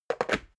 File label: water boiler put down